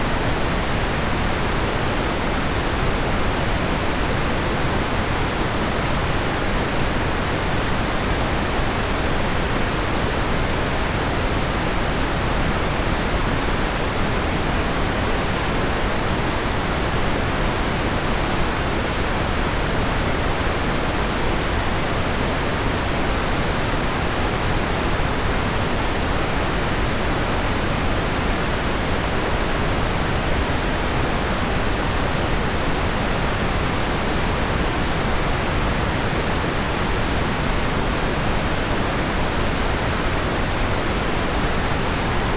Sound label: pink noise processed; flashlight